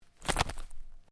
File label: Clothing Jacket Sleeve Flap; Movemement sounds for various articles of clothing; Dressing; Human